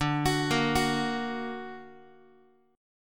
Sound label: D Augmented D down Guitar; D+ arpeggiated; JGuitar